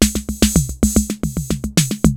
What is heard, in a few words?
TR606 RHYTHM; Rubber Chicken Software Sample Bank; DRUMS + PERC